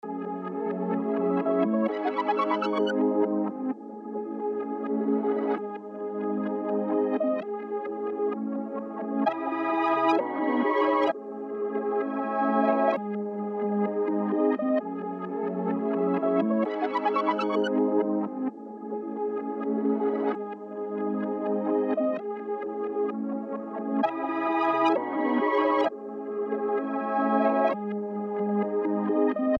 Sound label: Latin Melody Loop Gmin 130 BPM Pad